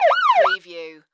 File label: Police Car Siren, Short Yelp